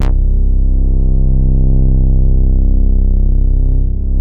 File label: BOUNCE, BASSES